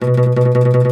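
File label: OUD tremolo, Plucked Strings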